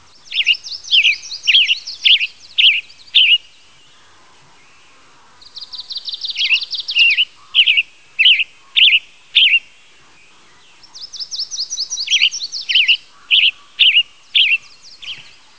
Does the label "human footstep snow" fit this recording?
No